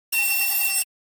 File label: Alert Beep Sci-Fi; Hollywood Edge; sound designer's toolkit v2